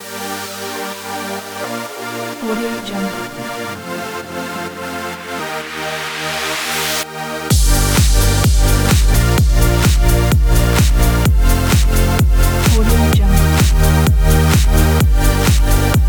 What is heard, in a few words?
powerful dance commercial intro